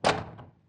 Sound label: door close; gamejam-22